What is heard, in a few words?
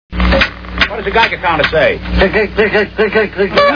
click